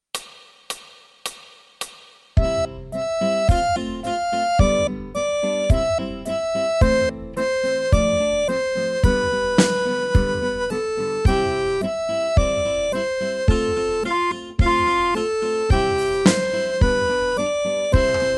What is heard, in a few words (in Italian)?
Fisa tutti